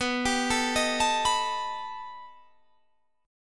B Augmented 7th B down Guitar Standard; B+7; arpeggiated; capo=2

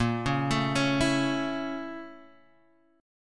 A sharp Minor Major 7th Flat 5th A sharp; A#mM7b5 arpeggiated; JGuitar

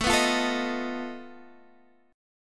B 7th Flat 5th A, guitar chord

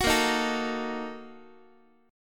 A sharp Major 7th Suspended 4th Sharp 5th, Guitar; strummed

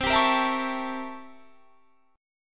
C 13th Flat 9th C

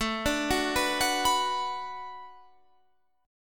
B-Minor

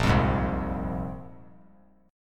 A sharp 7th Suspended 2nd, chord; strummed; 10,12,11,10; JGuitar

